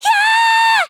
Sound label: yahhh; monophanie